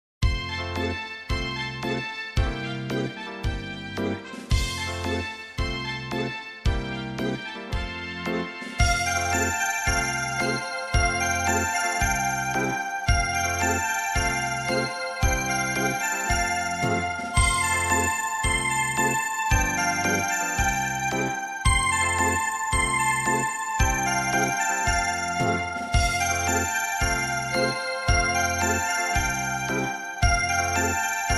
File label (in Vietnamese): mua vui beat, nhac beat